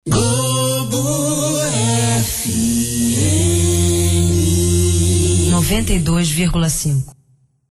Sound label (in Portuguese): Globo FM RJ Vinheta